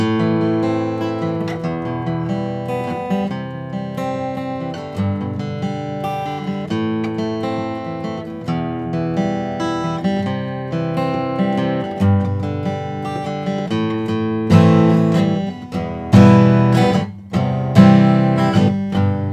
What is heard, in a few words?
martin hj 38 natural; 2008